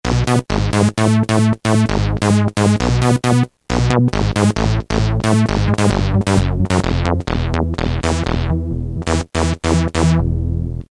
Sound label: acid, Roland M-DC1; Acid Tripper